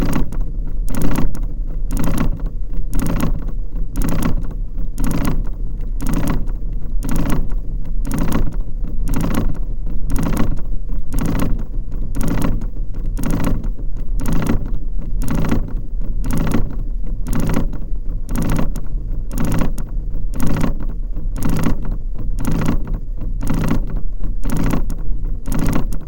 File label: with Tick